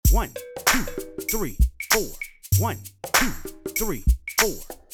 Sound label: loop 97